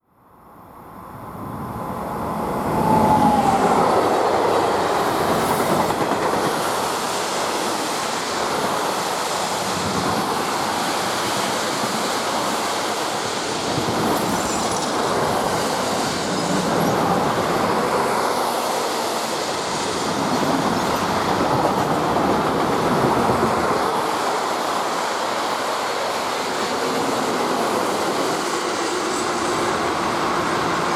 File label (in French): trains diesel et electriques; sonotheque